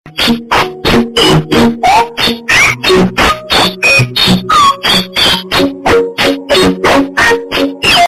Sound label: low quality tutorial music